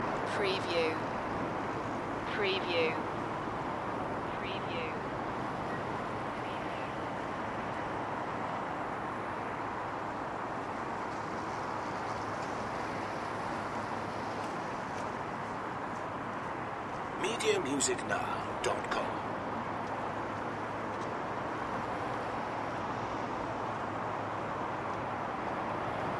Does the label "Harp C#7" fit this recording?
No